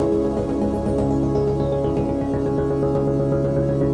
122 BPM Pads Amaj Fmin Tonic C, ambient synth pads; MMB